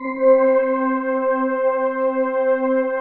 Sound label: PAD C4; Best Service Gigapack 1